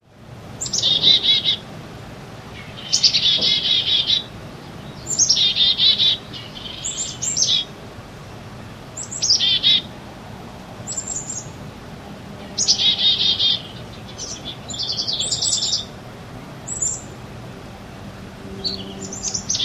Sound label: Chickadee & House Wren